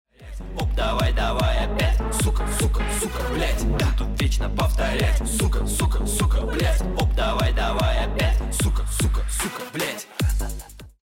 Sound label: bomb ten sec count; music kits custom